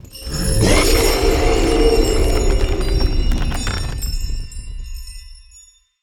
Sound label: lithoid angelic; lithoid portrait; Stellaris